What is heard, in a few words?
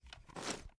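reload fast mag movement; gmod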